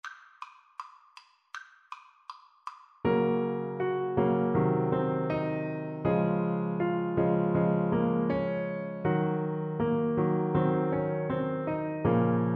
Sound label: Saxophone